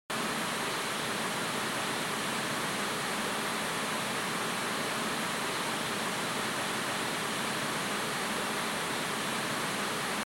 TV Signal Noise, Close

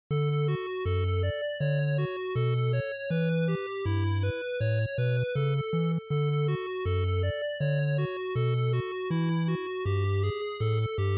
NES RPG Field

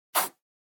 Mechanical Pen (Straight)

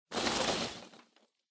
Loose Fill Packaging Hand In; 梱包材

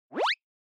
Anime Motion Dry

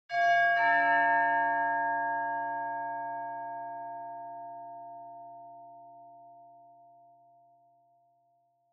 Doorbell Mech Tone Low Far; ドアベル 機械式 トーン; 低 遠い

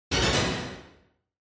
Quiz Question High; クイズ 出題

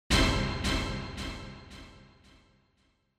Quiz Question Delay

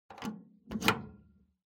PC Hardware USB Plug In

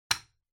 Light Switch-Box-Rocker (Dry-Hard)

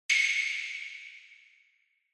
Single Accent Dry

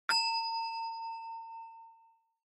Onoma Ding High Mid

